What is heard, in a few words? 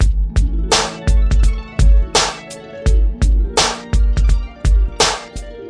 084 bpm hip hop double harp gated sd